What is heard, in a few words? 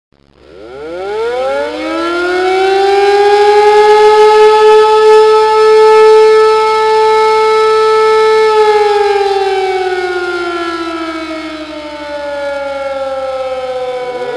Air Raid Siren